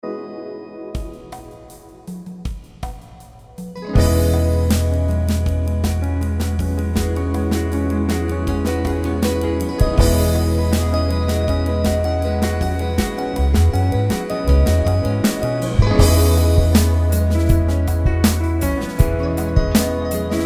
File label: acoustic piano